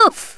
oof; Worms Armageddon; Thespian

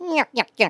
laugh; Worms Armageddon; Stooges

